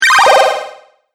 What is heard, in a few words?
Classic Delay Game Hit, video game sound effects